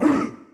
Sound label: Chant